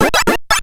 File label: Coin; Pac Mania